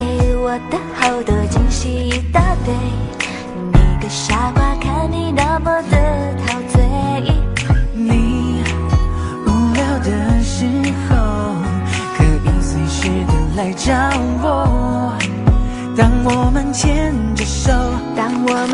cute taiwan music

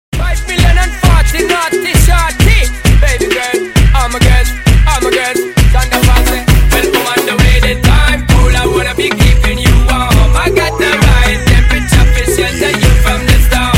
remix ringtone

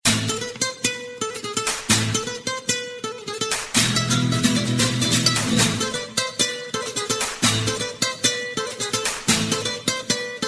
spanish guitar

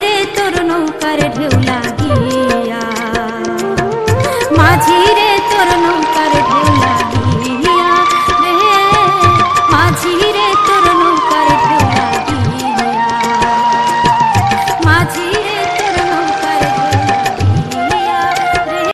bengali ringtone

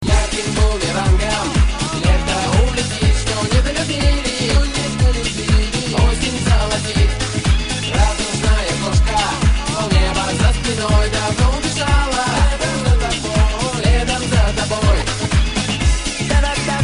russian dance

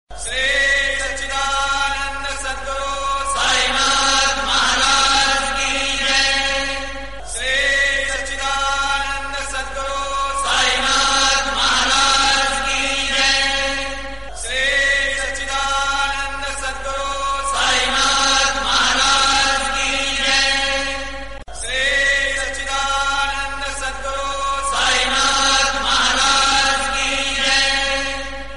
shirdi temple ringtone

